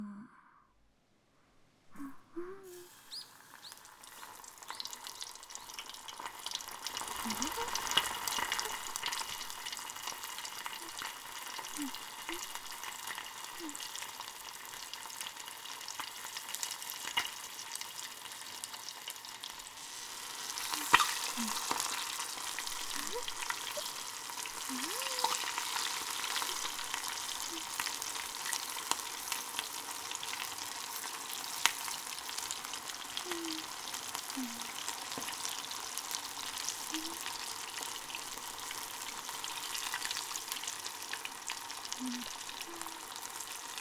料理する＆風の音、鳥が鳴く声

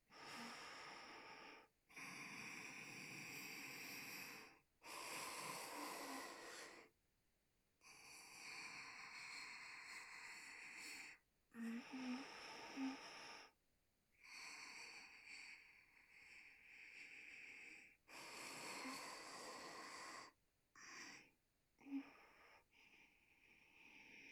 寝息（センター）鼻息

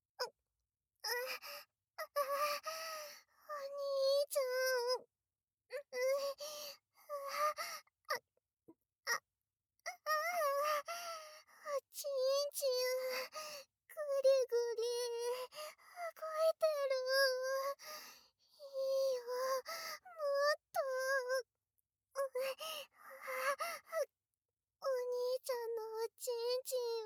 右耳舐め; ステージ２右耳舐めまで; ASMR

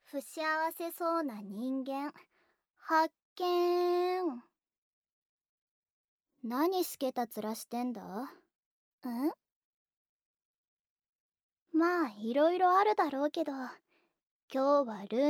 トロ甘耳舐めささやき手コキ, ASMR

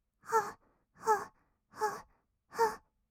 はっはっ, kawaii voice; ASMR; 合いの手; 1200 kawaii voice!!

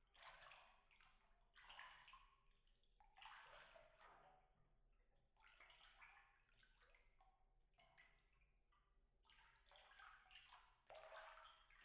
足湯でまったり背中流し, ASMR

